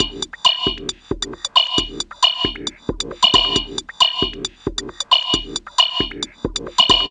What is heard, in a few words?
House; Best Service ProSamples vol.10 - House; AKAI